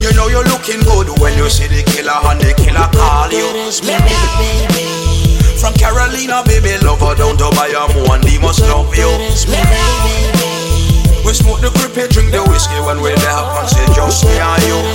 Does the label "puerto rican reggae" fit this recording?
Yes